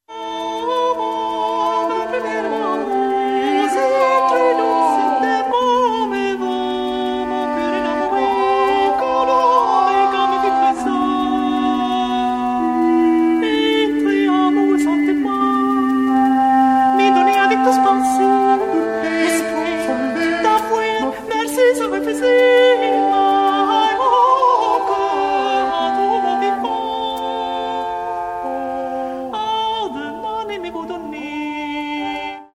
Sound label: motet